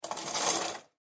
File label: Household Shower Curtain Open, bathroom